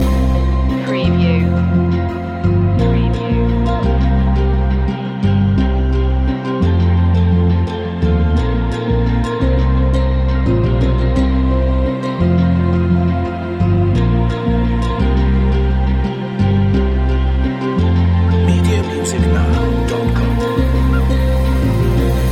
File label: lounge isle loop